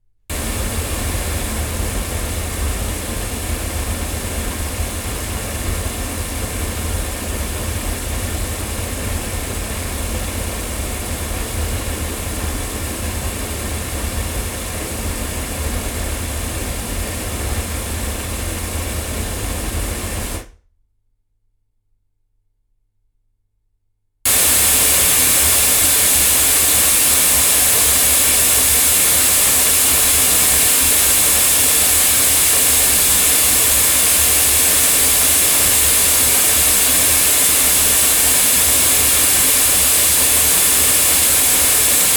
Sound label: Generis GT2 Mk2 omni loudspeakers